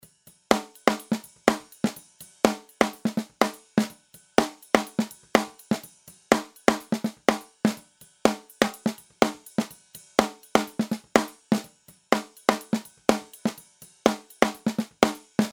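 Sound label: snare normal comp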